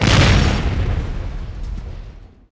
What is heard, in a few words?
explo; impacts explos